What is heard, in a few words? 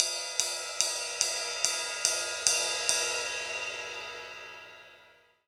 Ride Hits, Live Kit; neuro; Stanza